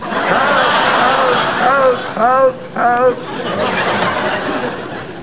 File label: program error